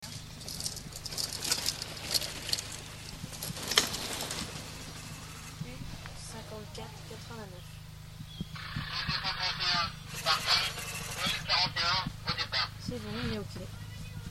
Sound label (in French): ambiance arrivee slalom lyceennes